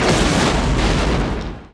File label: EXPLOS; Best Service; Advanced Mega Effects